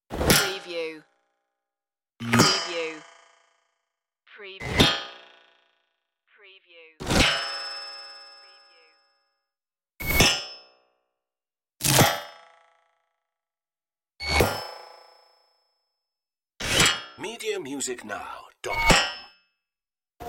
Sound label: fluttering metallic impacts; shock; the sound pack tree